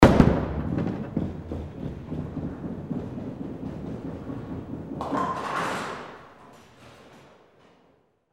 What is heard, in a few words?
bowling ball not hit pins